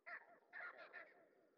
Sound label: monkeys; dota